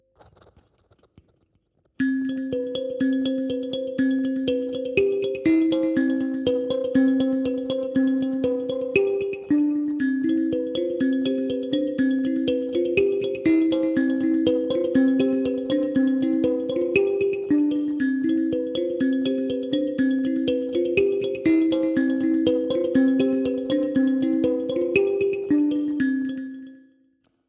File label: piezo song